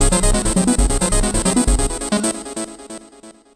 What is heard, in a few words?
Bass, Futurist Drum & Bass